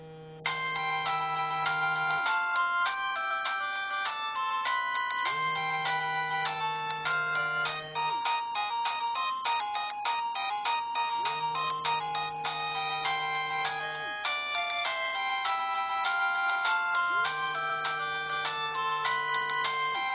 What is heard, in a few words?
Polyphonic Ringtone; ringtones